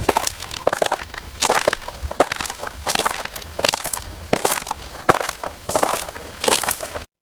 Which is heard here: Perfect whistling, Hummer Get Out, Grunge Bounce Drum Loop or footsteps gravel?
footsteps gravel